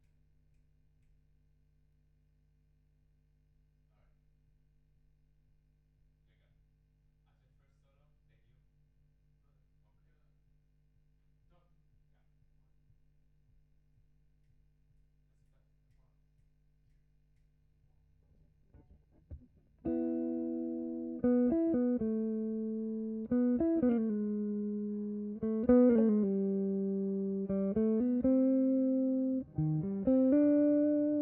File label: GTR B